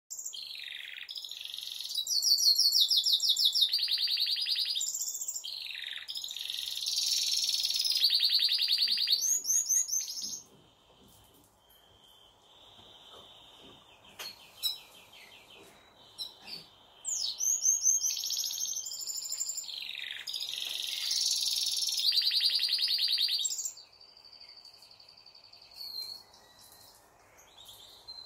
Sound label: Canary, Bird Songs